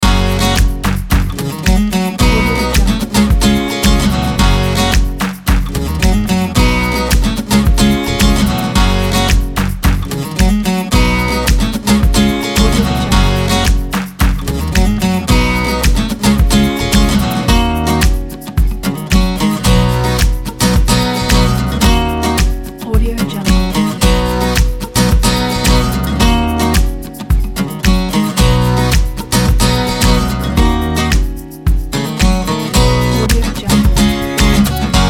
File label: inspiring folk loop